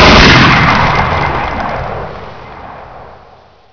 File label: mg42 shoot